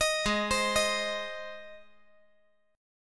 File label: Ab Major Ab; arpeggiated; 20,20,20,18; JGuitar